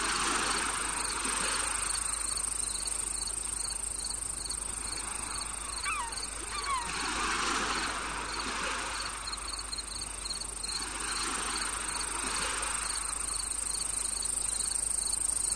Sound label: Tropical Beach At Sunset